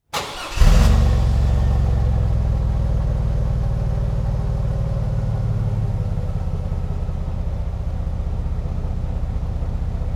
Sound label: Auto Avalanche Start Idle And Shut Off Near Exhaust; Transportation